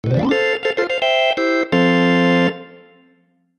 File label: Win Game Sting; rocket monkeys monkey business